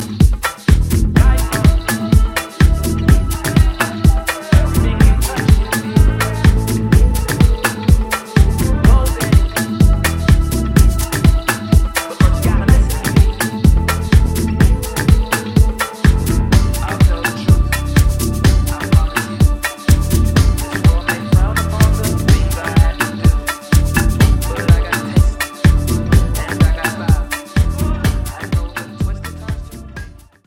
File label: Devils Whisper ADD BASS